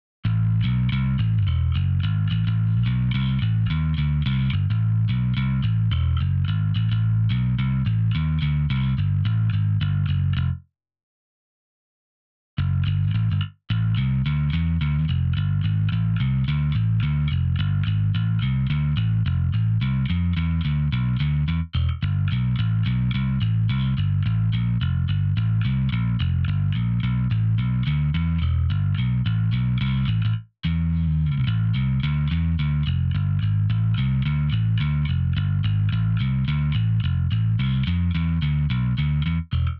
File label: Bass